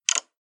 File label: Iphone Lock Sound